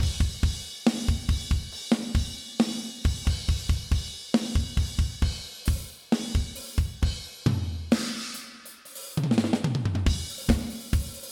drum section, Alt Rock; mix

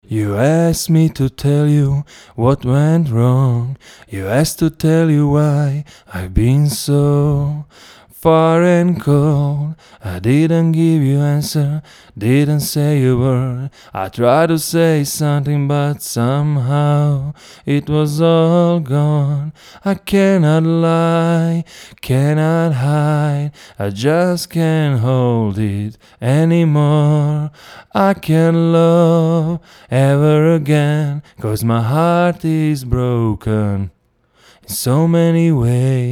vocal; mics

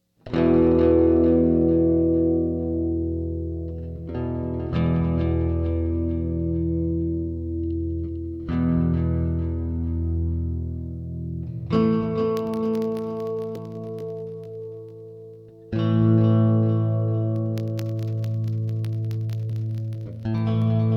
noise

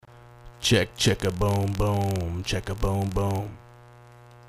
checka boom

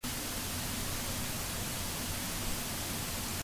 evil hiss

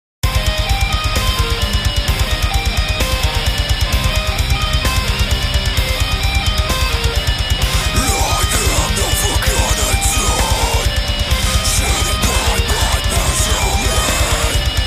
progressive shred metal

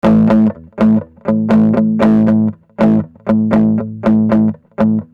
chords

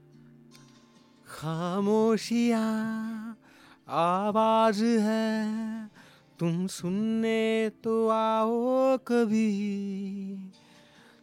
vocal sample

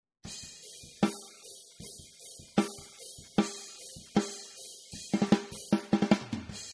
my snare

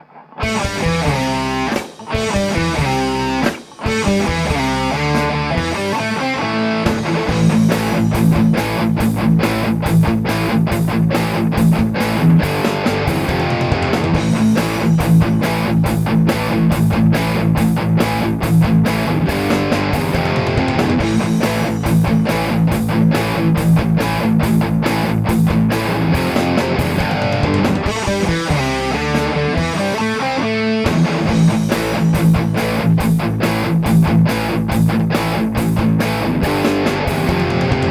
tone test

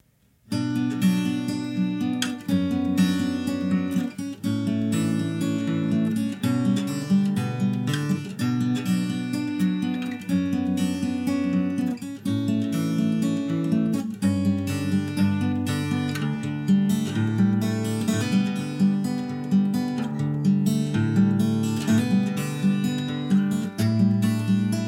acoustic gtr recording

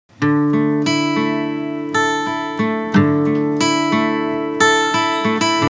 guitar

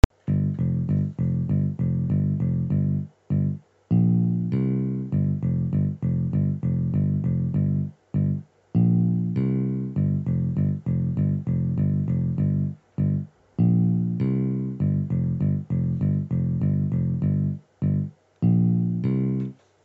bass; Boss BR-1600CD user bass patters

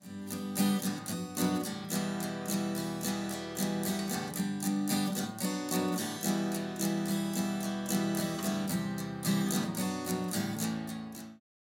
acoustic test, acoustic guitar

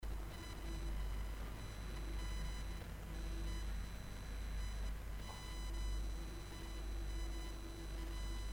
high pitched noise sample

